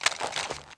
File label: scout inspect; czero opforce